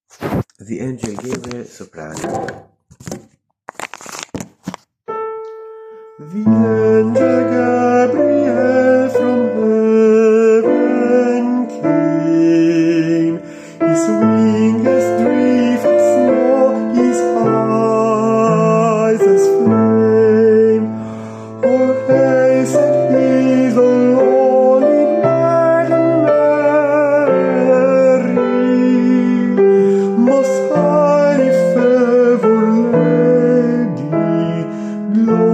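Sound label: angel gabriel soprani, coro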